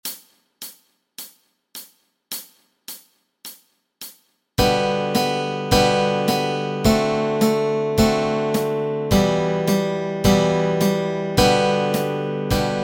Jolly Old St. Nicholas melody and chords

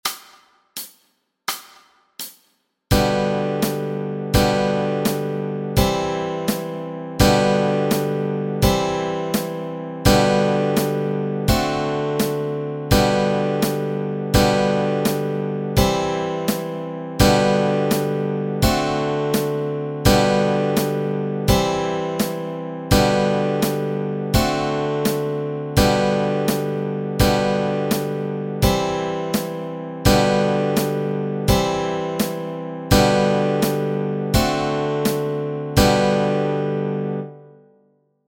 Chords Track, Easy Guitar and Ukulele

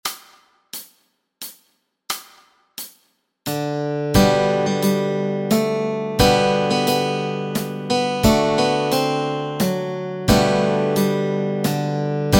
O Christmas Tree melody and chords

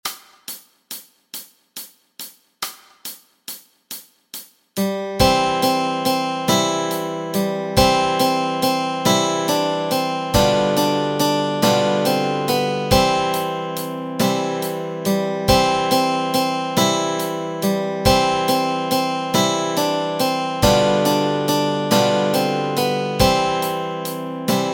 melody and chords

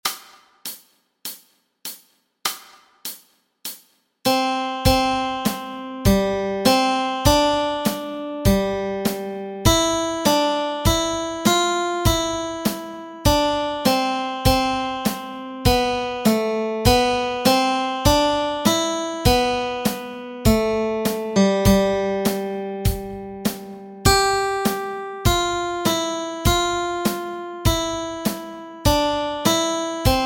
melody

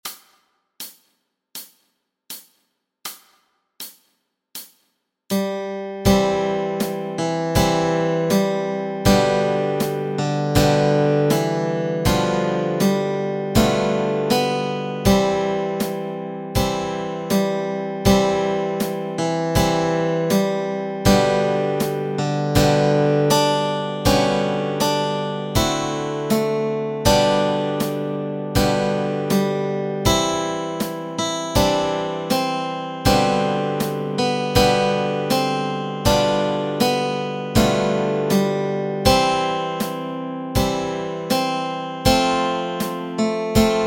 Melody and Chords Track

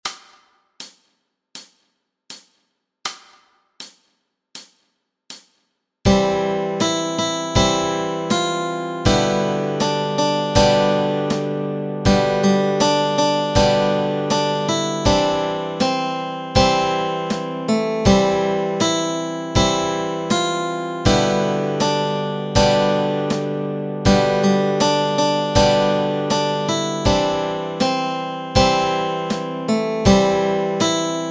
Melody and Chords Track

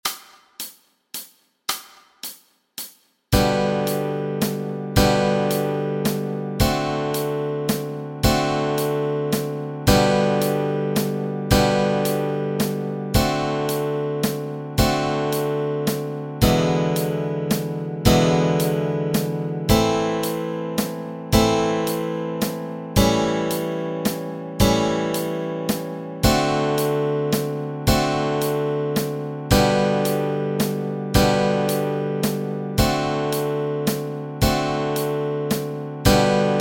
chords

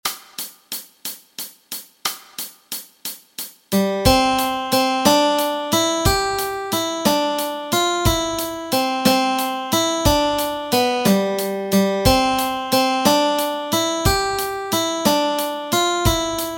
melody

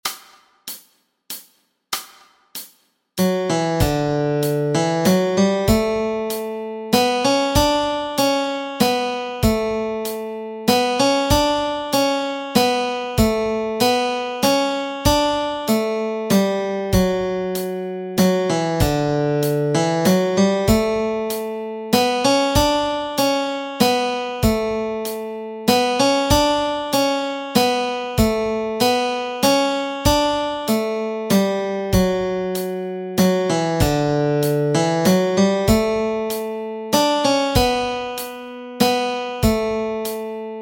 melody

